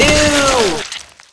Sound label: eww